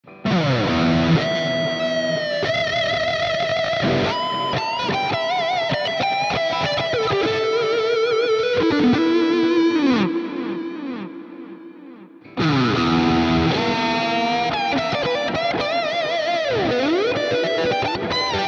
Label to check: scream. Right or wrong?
Wrong